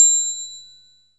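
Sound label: cymbal, Ethnic Perc; Percussion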